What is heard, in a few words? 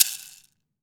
wood shaker; AKAI S-Series CD-ROM Sound Library VOL-5